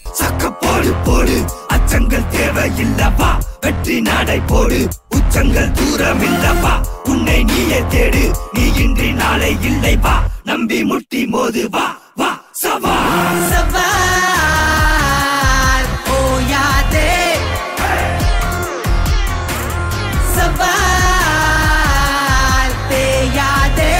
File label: Tamil; ringtones; Kanaa